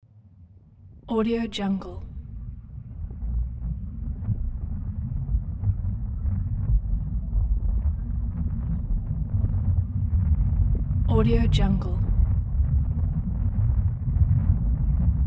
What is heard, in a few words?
deep rumbling earthquake; hollywoodedge